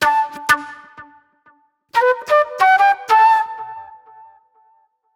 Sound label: Sharp Delay Flute; Sonic Foundry (Sony Creative Software); Manhattan Flutes; Mixed Berry Jam